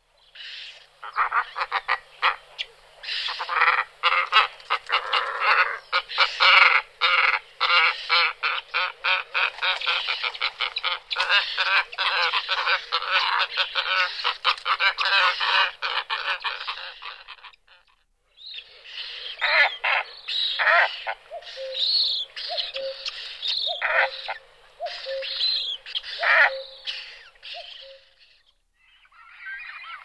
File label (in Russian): p.falcinellus, golosa ardeiformes